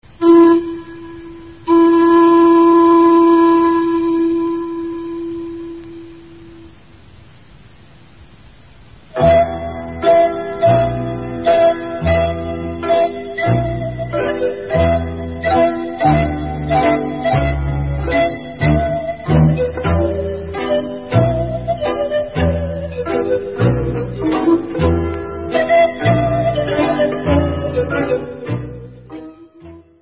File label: Instrumental